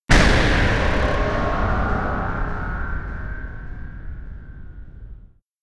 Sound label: Multiplayer Match Over